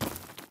stone footstep; everness

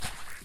mud footstep; everness

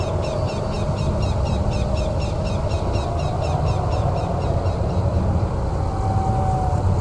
ambience graveyard; everness

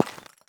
ceramic dug; everness

